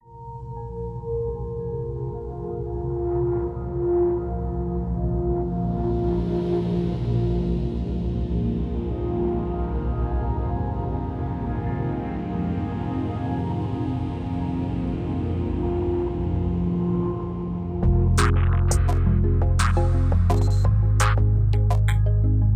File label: 85bpm fast introspective electro